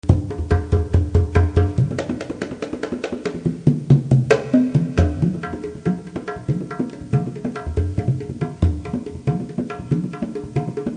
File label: jaipong; bandung